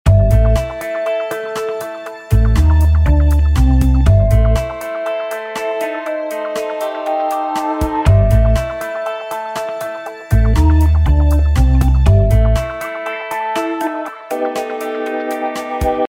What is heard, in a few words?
Spy Hard Short Loop